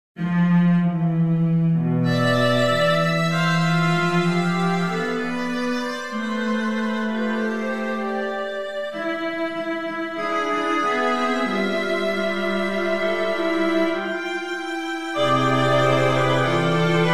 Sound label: String 4tet Interlude